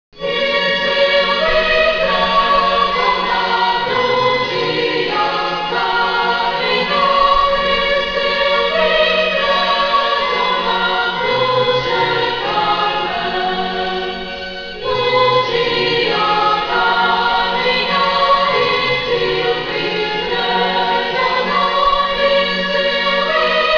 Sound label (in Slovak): hymna